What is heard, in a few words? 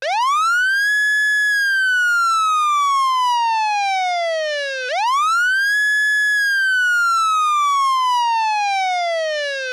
Federal Signal 80k Airhorn